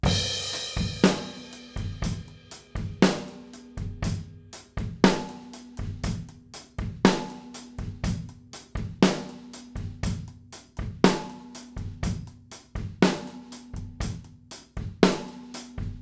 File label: drums retro 600 ohms; peluso67